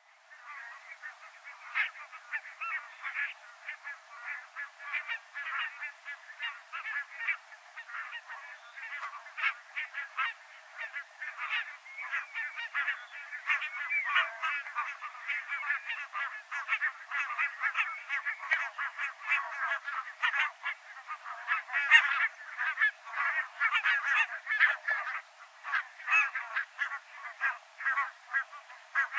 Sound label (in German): Nonnengans